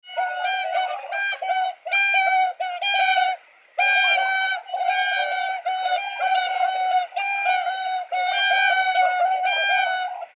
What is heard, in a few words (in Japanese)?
oohakuchou